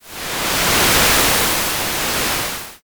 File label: wave